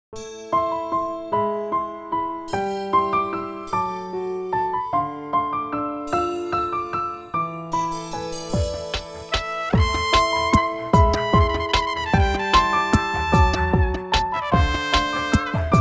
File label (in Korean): Melodic, 리듬